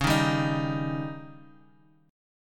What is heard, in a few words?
Db Suspended 2nd Flat 5th